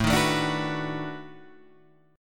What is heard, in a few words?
B 7th Sharp 9th, guitar